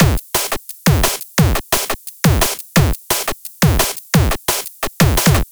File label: chip DnB; flstudio